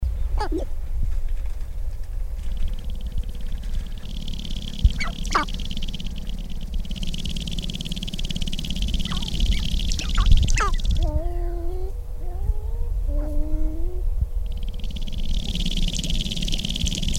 Sharp tailed Grouse

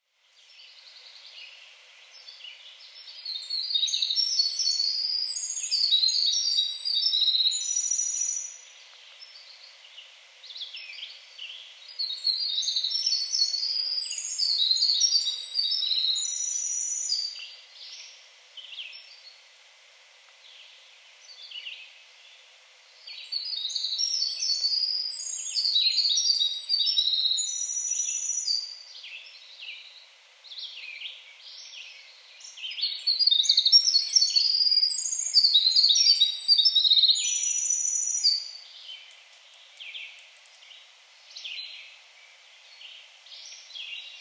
Winter Wren; Rib Mountain SP

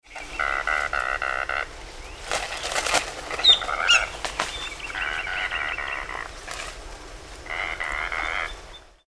Anhinga Calls

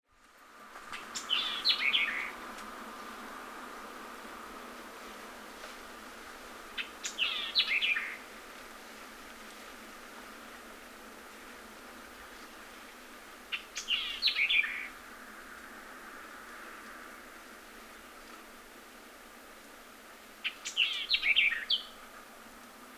White-eyed Vireo

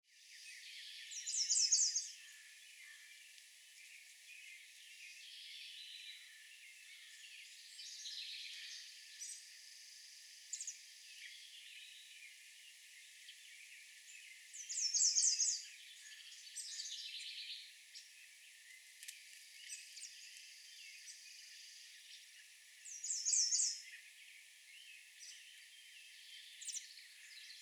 American Redstart

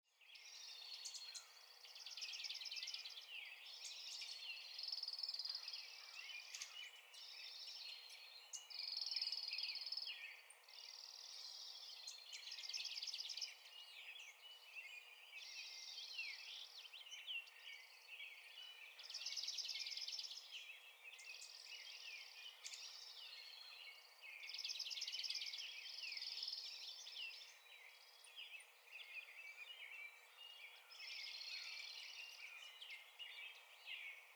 Dark-eyed Junco

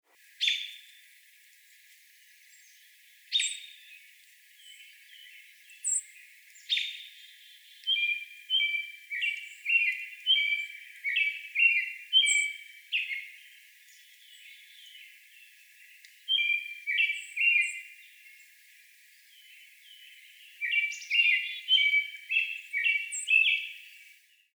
American Robin Song

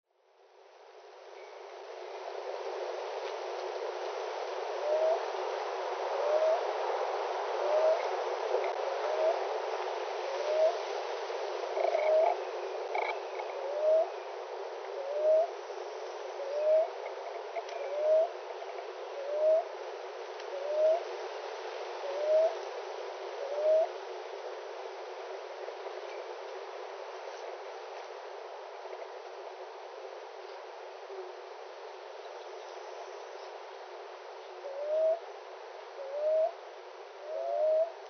Common Ground-Dove bouts Windy

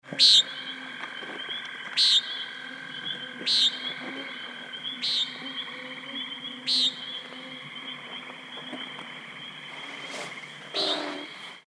CONI Closer

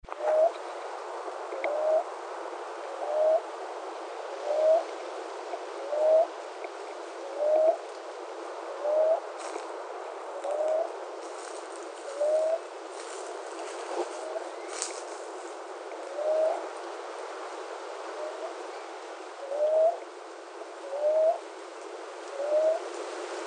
Common Ground Dove

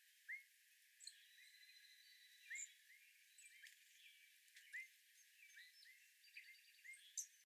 Evening Grosbeak Cool Vocalization

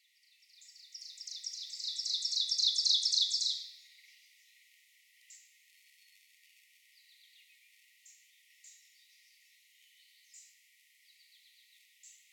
Ovenbird Songs; 9 Songs

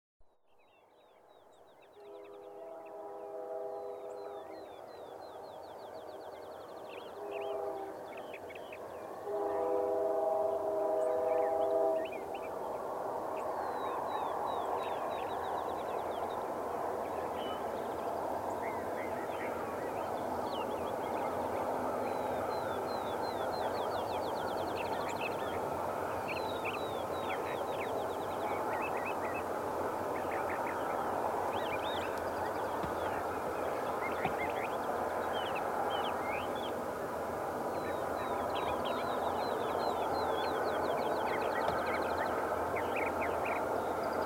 Dawn Train

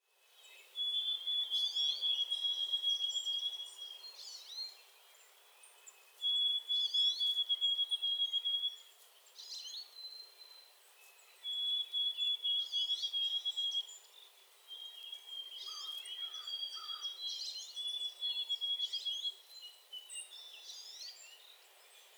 White-throated Sparrow

